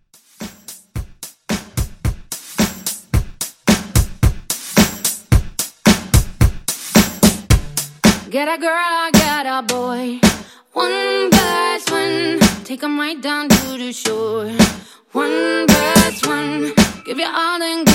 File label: Mainstream Pop Redrum; Remixfreakz